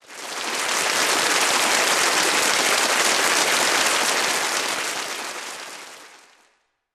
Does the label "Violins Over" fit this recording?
No